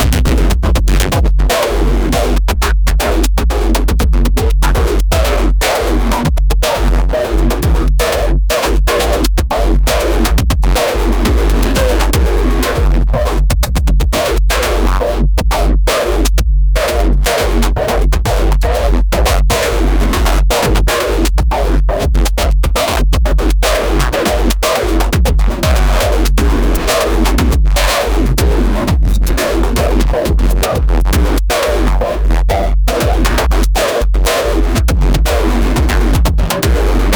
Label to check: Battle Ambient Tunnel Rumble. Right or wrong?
Wrong